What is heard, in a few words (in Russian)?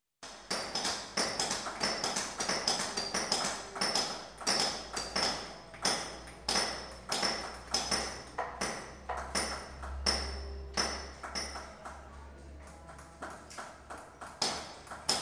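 атмосфера большого кузнечного цеха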